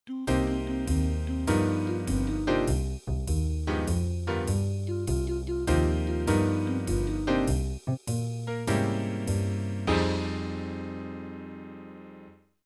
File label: riff in chords